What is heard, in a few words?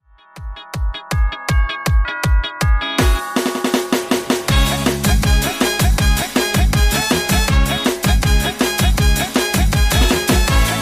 thai song dance